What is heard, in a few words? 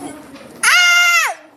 loud baby scram